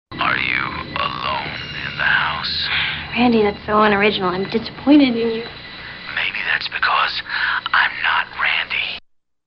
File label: HALLOWEEN SCREAM